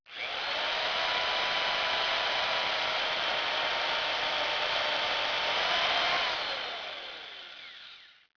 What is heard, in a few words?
circular saw